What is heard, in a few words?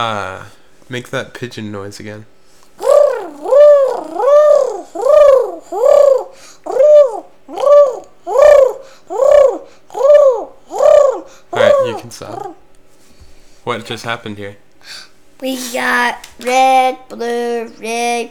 Makes Pigeon Sounds